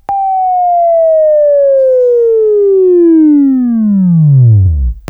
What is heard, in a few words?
Buzz; Labview-Program; Sweeping Training program